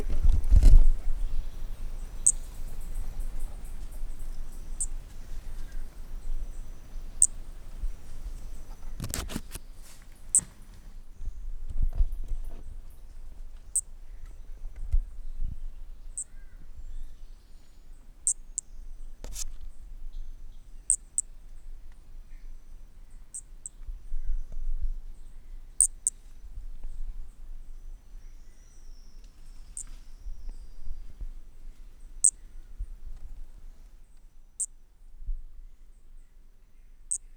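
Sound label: Brachycephalus sp; 4 notas perdidas; Corcovado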